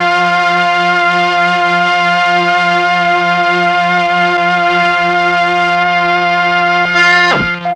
MEL STR, Legendary M400; ILIO; Propeller Island

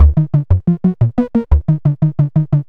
synth arp; Electrocution

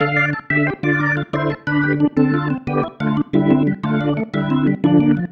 Pattern, Organ

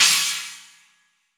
Splash Cym, Cymbals; Roland BK-5

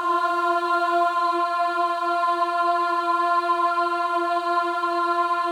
AH F4, WOMEN AHS; Spectrasonics; Symphony of Voices

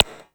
zap, e-percussion, techno; Techno ID; single-drums

